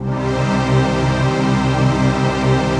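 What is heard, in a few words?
chord pad, Pads; Best Service; XX Large - Pads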